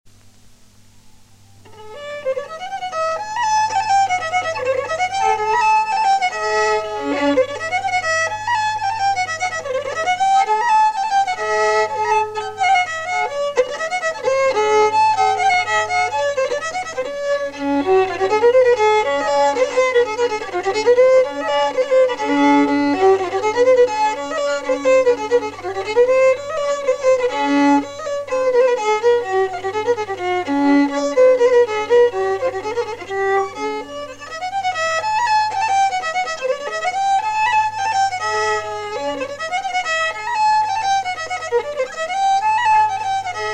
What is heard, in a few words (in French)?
bourrée